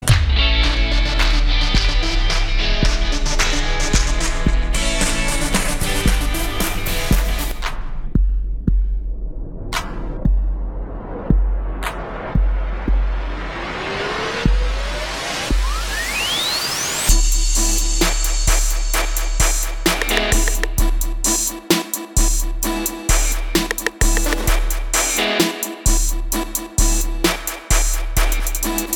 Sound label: Samsung Note 9 Ringtone